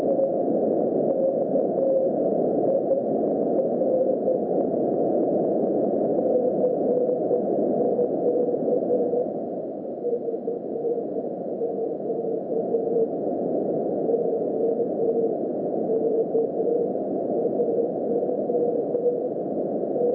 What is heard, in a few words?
6cm; Moonbouncers; Orebro 2017